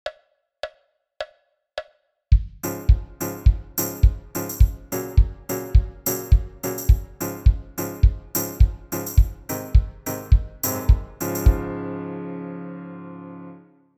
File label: example of mixcraft effects on drums and piano